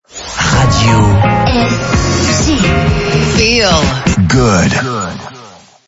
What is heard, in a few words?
jingle